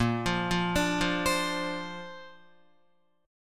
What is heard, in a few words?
Bb Major Add 9th, Bb, down, Guitar; arpeggiated; JGuitar